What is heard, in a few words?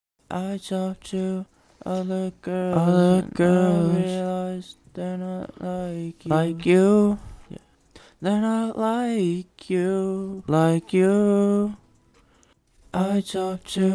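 Pop, 152, F sharp minor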